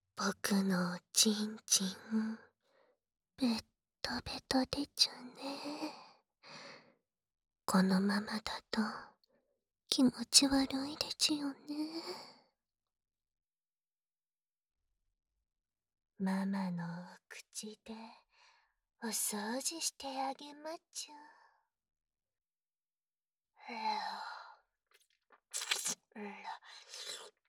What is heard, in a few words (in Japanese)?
お掃除 フェラ と 耳舐め 左右入替; 分身してお掃除フェラと耳舐め SE無し